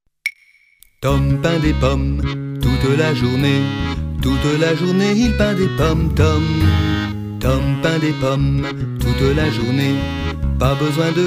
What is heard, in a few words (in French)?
ensemble vocal variété jazz chant du monde